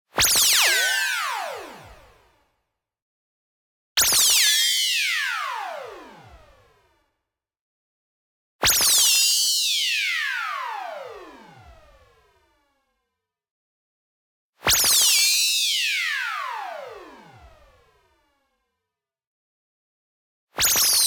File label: tonal, large jump; boom library; Anime Essentials